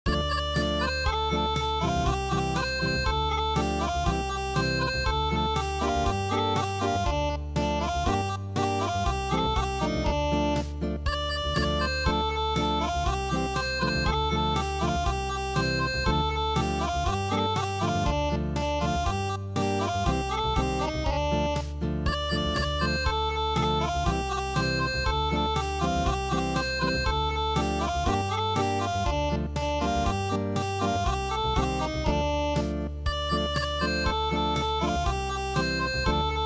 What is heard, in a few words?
Acoustic